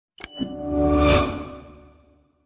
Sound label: ringtone